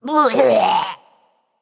clear throat; smite; babayaga

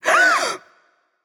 nv breath; smite; awilix

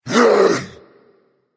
grunt attack berserker; cuchulainn

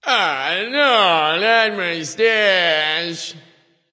death; Smite; bacchus

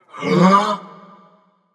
nv breath; smite; xbalanque

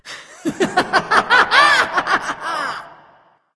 laugh; smite; voicepack; hel

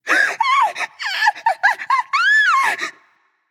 fear; smite; awilix

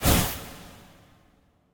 fire impact; hades; smite

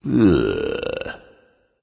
"ugh"; smite; voicepack; ullr

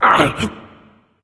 grunt hit; smite; xingtian

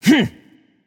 gruntjump; smite; voicepack; mercury

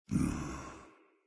idle breath out; smite; odin

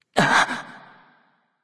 surprise; smite; ahpuch

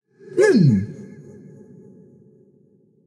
nv tongue; smite; voicepack; hachiman

